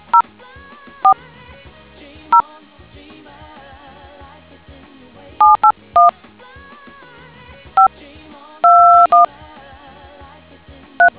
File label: dtmf